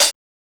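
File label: HH, hi-hat; X-Static Goldmine 1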